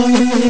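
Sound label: didgeridoo; CMI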